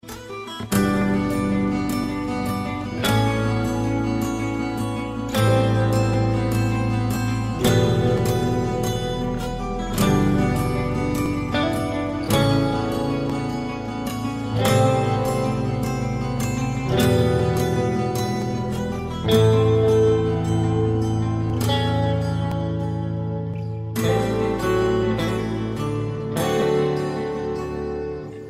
ringtone; Last of us

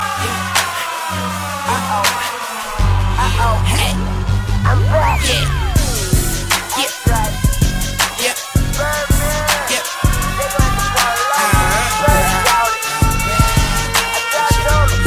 Fire Beats

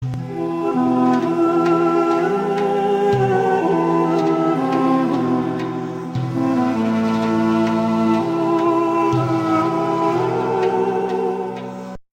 Duduk Intro

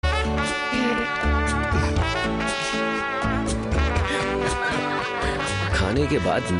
Cool Sms

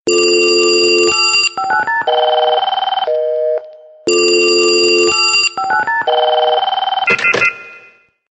Phone Party

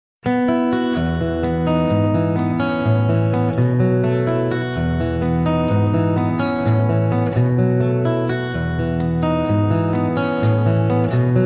Best Guitar

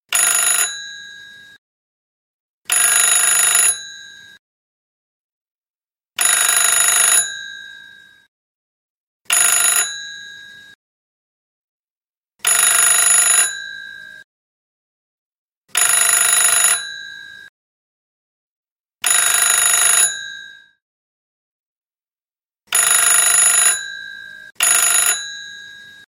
HTC Classic

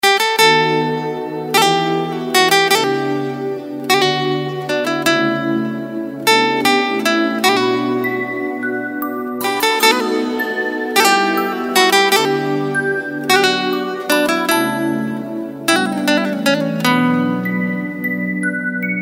Gitare

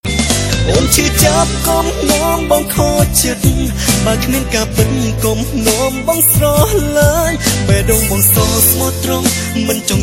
Khmer Song

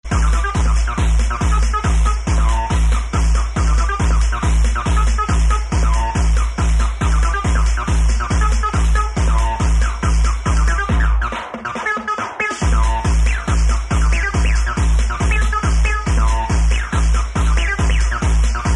Acid Core

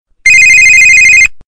Classic Ringer; Moto

